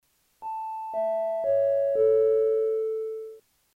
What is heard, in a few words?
sound down, microphone; mv-p370m